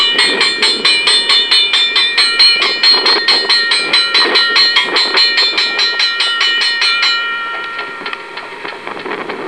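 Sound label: railroad crossing bell; DieselLocosSite3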